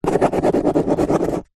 office pen ball point write on empty cardboard box